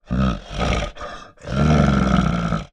animals bear growl grunt

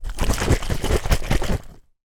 drink wine bottle shake

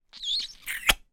human kiss

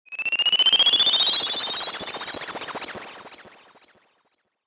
sound design fm stutter ascend beeps; zapsplat

